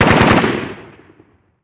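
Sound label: gun m16 medium; Call of Duty Modern Warfare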